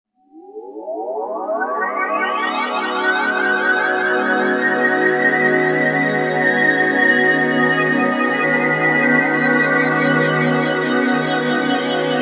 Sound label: STARLIGHT WAVE, SLOW SYNTHESIZER WAVES